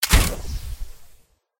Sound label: crossbow shot